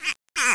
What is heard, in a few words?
compudead